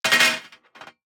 DICE on Metal, Throw and Roll, Standard, 5 Five Dice; Samples; ArticulatedSounds; DICE Sfx Party (Extended)